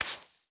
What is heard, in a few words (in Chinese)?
刷子刷沙子声